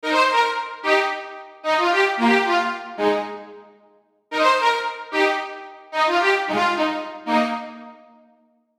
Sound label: Strings; Science; Unison One-Shot MIDI Essentials